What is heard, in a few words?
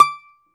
domra, Russian strings; World Combos; E-MU Producer Series Vol. 7 – Old World Instruments